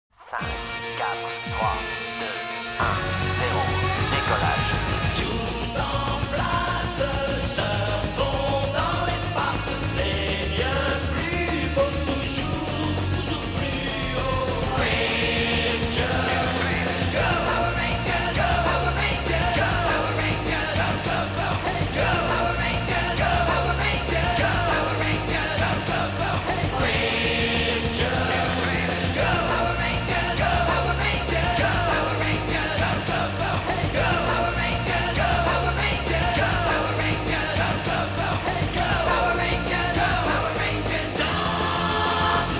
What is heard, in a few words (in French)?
musique generique